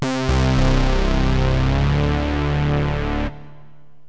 BIG BASS

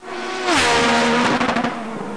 flash

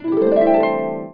harp; tuxpaint

